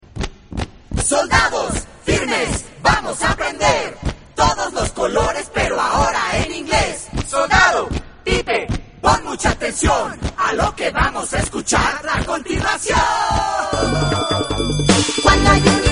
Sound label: pipe